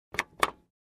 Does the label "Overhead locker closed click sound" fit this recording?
Yes